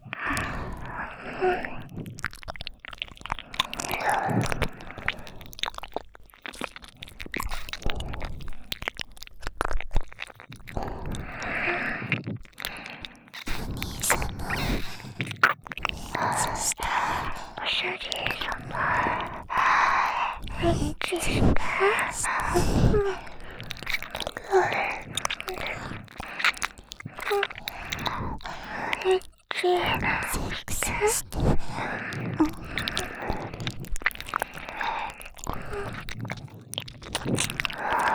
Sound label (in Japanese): 耳舐め; 静華; エッチしよ